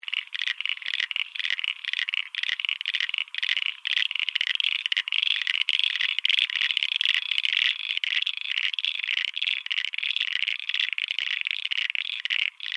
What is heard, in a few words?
ANIMAL FROG UNKNOWN AUSTRALIAN LOOP; frogs recorded in different locations over time; Animals; Miscellaneous Animal